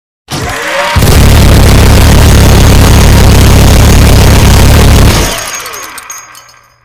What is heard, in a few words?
minigun bassboosted